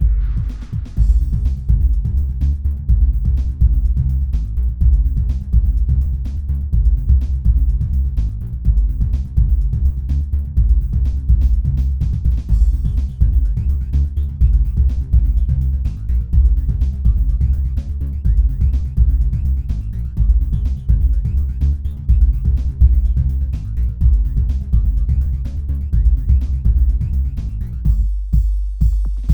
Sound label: way too much bass